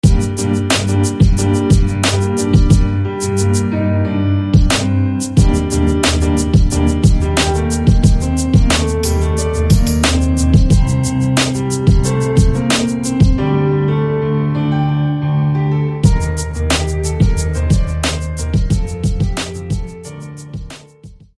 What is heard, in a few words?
Piano; BloodCore; SFB FX